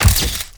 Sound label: Pickup Special Film